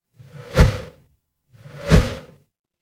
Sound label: transition whoosh